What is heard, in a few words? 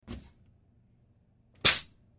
Oxygen Valve Button, air